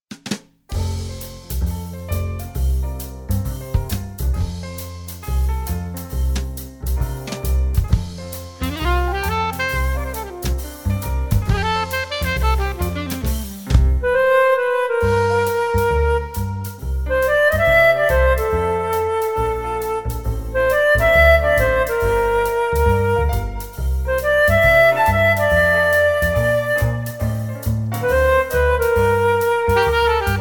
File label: flute, F